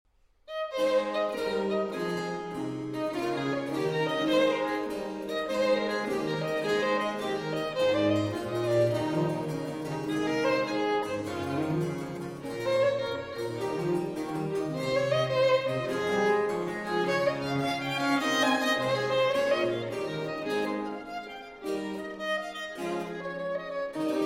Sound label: Violin Sonata in A Major, Giga. Allegro